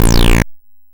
Apple II FX Desintegration Laser, Chiptune

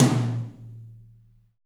SLAMTMMID; Drums In Your FACE!

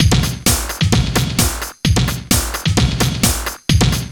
TECHNO, Funky Xtreams; INIS

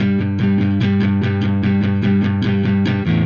Silos Dirty Strum Guitar, Indie Rock

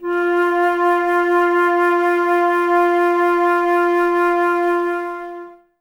Flute, Woodwind

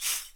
TAMBORIM; E-MU Producer Series Vol. 5 – 3-D Audio Collection; 3D Percussives; 3D Ethnics

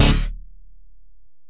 Bell, Alesis DM-PRO

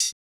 ride, cymbals; Dingus; RoBKTA Sample Pack Supreme

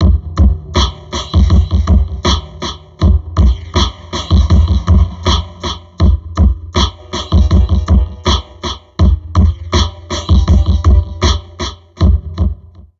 Drum Loops Crazy Processed

